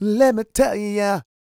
SING, Vocal Planet R&B & Dance; ILIO

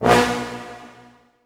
brass accent; Orchestral Loops

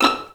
VI PIZZ E5, Advanced Orchestra; Best Service; Peter Siedlaczek's Advanced Orchestra

